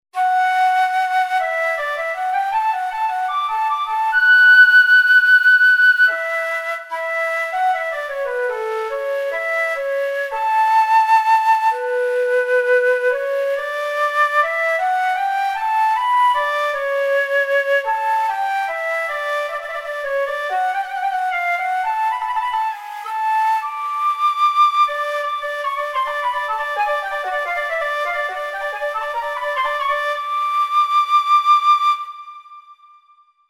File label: Vox Flute, v-synth; v-synth gt